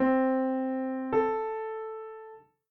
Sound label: major sixth